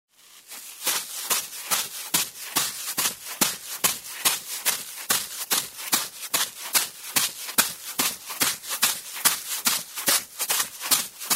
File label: Running on dry sand barefoot; steps